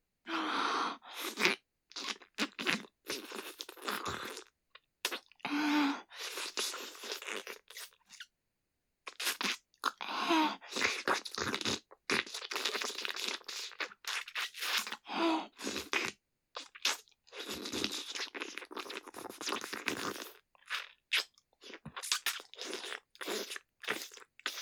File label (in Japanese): フェラ(口交)